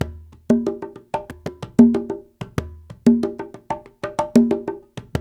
93, Latin Congas; African Contemporary; Spectrasonics; Supreme Beats

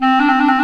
THE CLARINET

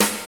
SNR BASSY, Snares; Roland - Rhythm Section; Snares 6; Sn Modules 6

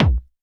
hard bass drumz

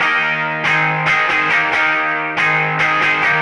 Electric Pop Guitar 140BPM D#maj; Electric Pop Guitars; Vengeance - Essential Clubsounds Vol.4; Guitarloops

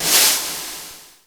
FX; Greytsounds VOL-1; TAILORED R8

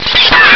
argh; Amiga Soundtracker Sample Packs

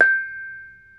BONANG, Bronze Gamelan; E-MU Formula 4000 Series Vol. 4; Earth Tones

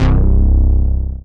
BAS, Dance Bass

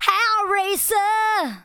VOCALS SUNG; Techno Trance Essentials

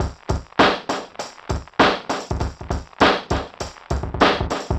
drloop, drumloops, Vinyl Attack; Masterbits

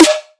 drum snare flashy; Virtual Riot; Drum Hits

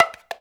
African Perc

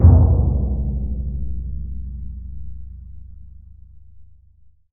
TMP C#, Synclavier Percussion

